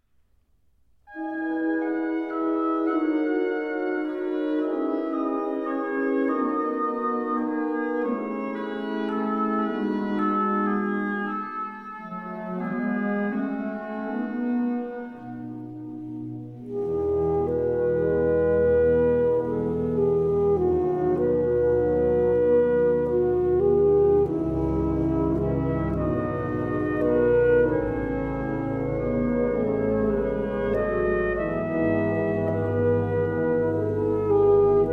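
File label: Rhapsody alto sax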